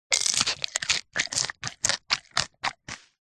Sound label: horror creature eating bones